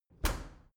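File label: dry cleaner open hard, maschinen türen; tueren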